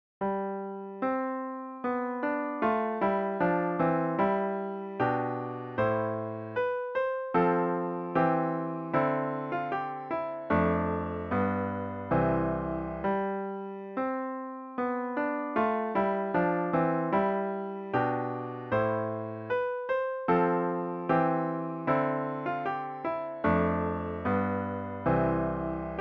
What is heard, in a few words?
piano solo